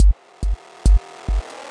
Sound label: Short Loop